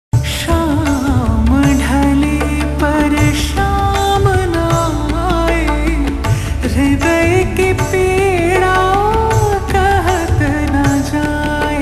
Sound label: Ringtone Slowed Reverb; binodiini